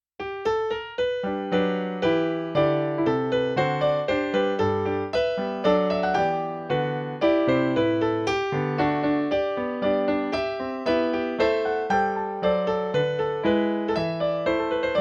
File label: gavotte; wedding